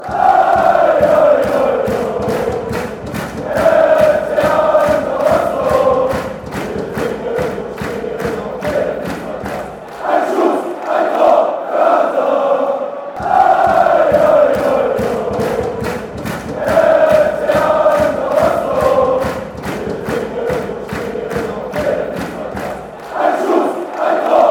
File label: a goal, fan chants